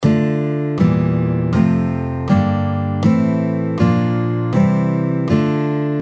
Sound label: D Minor Chord Scale, guitar